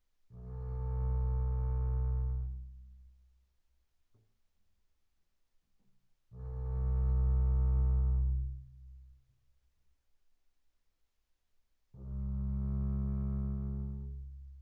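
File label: Bass arco sul E pianissimo B1 Bb2, double bass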